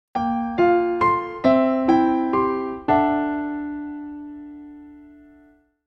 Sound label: jingle